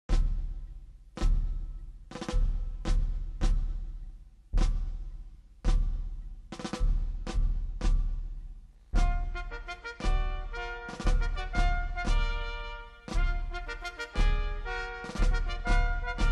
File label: chorale battle hymn